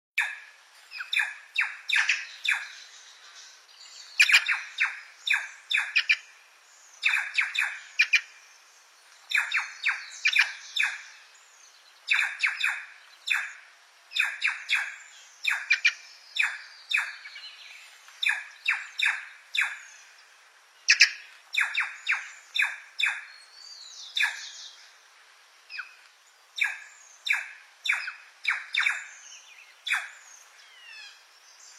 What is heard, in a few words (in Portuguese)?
canto da gralha picaca